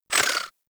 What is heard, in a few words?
Pistol Proj By; Guandao